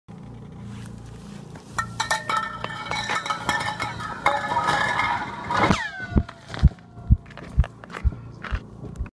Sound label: Dropping ice down an arctic borehole